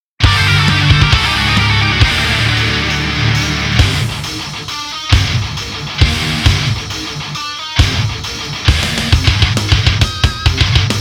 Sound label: Deathmetal; One Coin Short